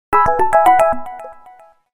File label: A toy watch; Samsung GT-E2252; SMS